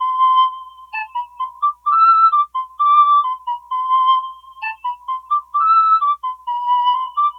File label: Melody Loop 130 BPM A Min Flute; Loop Stems; Cymatics; 2020 Melody Collection; 2020 Various